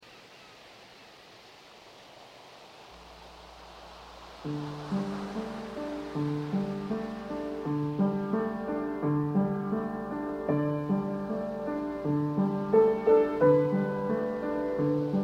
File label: pieces for piano